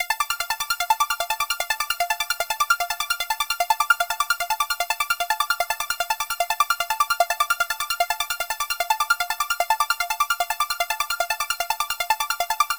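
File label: Arp Loop, 150 BPM D minor; Dubstep; Arp Loops; Synths; Raptor; Cymatics; Dubstep Starter Pack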